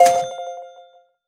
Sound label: collect reward